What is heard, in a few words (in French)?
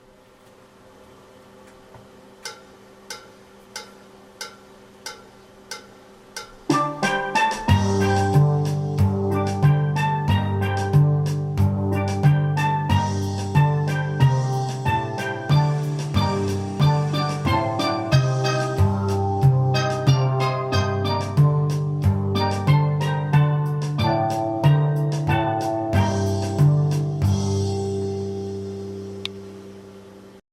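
BASSE Harmo 3 voix + basse 92, Steel Band; bella-ciao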